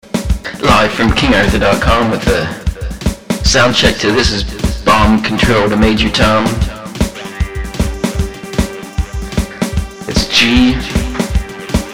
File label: Soundcheck